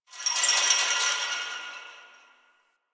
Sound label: Fence Rattle One Off; Warframe; Ambience; Nineteen Ninety Nine